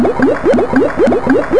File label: Bubbles; Lottery Wizard 2.0